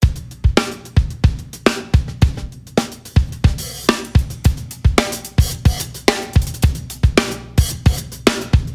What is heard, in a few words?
ac drumloop snappy shooting